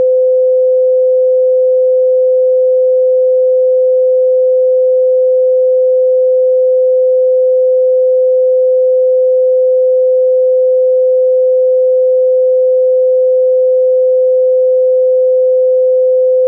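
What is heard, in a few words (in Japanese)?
512 Hz, 信号音; 6 オクターブ上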